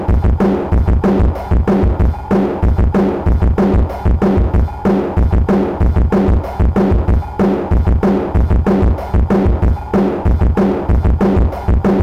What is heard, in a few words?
drums res